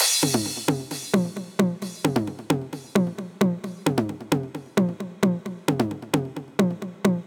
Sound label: 909 132 Build, Drum Machines; Tresor; Ableton